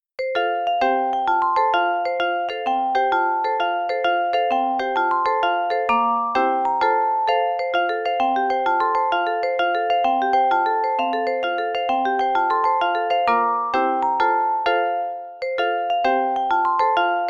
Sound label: Pop Goes the Weasel (Vibraphone)